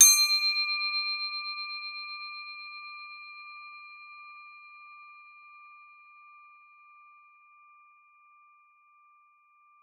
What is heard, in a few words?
CRO D4, CROTALES; Xsample VOL-4